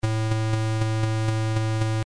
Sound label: dpcm